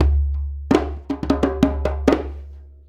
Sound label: Djembe Fill; Sonic Foundry (Sony Creative Software); Essential Percussion; Drums